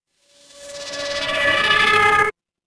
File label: transition riser